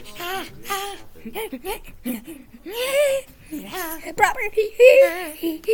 pant

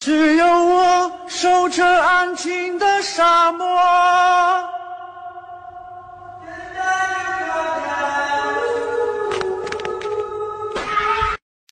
chinese man singing

